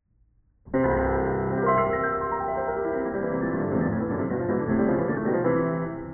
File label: liszt 5khz